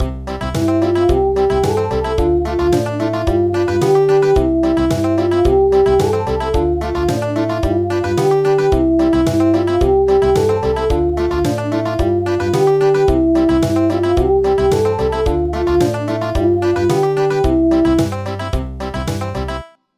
Country; El virolet

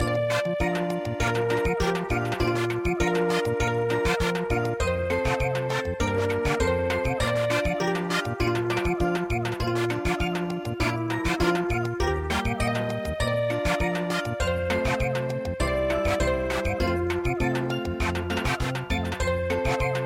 Samba

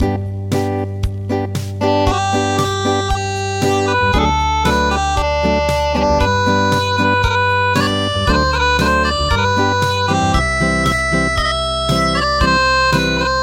Acoustic, traditional

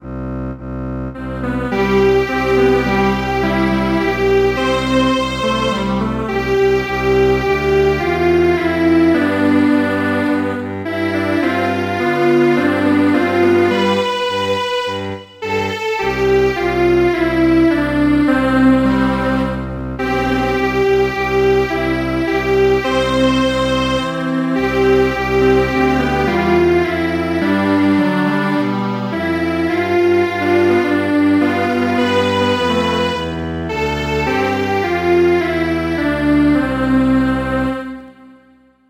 Strings Quartet; Clap hands with the ladybugs

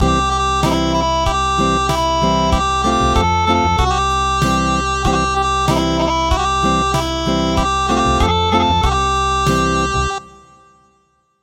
Acoustic

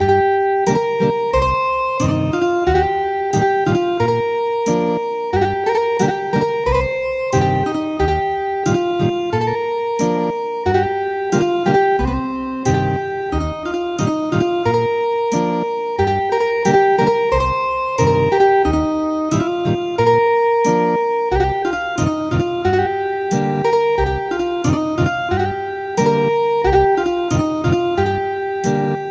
Thai lullaby Ethnic Guitar

